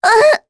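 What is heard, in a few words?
Damage, Korean; Talisha; kings raid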